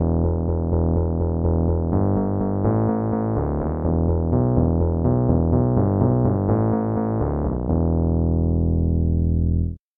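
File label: rhodes electric piano; bass; SpectMorph Evaluation #4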